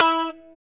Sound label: POP E 4, STRAT guitar; Spectrasonics; Hans Zimmer Guitars VOL-1; JC-STRAT POP